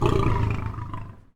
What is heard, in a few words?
lion cry